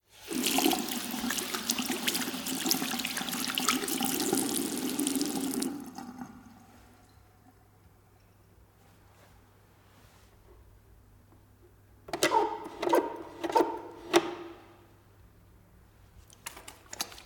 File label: washbasin sounds; The Sound Pack Tree; The BIG Pack